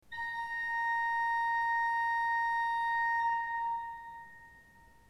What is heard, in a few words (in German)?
ton, orgel